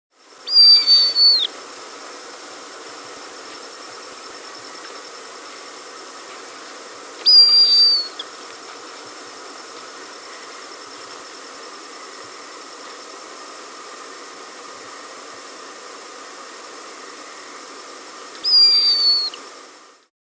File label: White-Rumped Hawk (Buteo Leucorrhous), Andean Birds; S. America